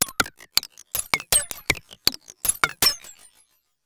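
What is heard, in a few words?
percussion, ambient, 160; eJay; Drum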